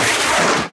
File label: shoot; PC Gamer IT CD 48; Big bang